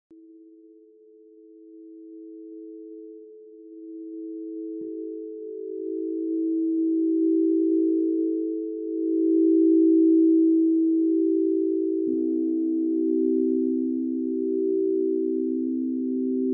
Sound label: Singing Bowls; 1hr; Meditation; ambient